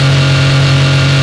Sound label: vehicle